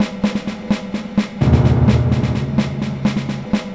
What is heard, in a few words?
Battle Drumloop; treasure planet battle at procyon